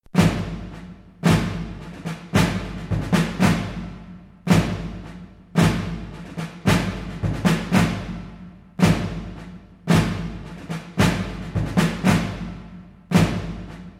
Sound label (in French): Marche tambour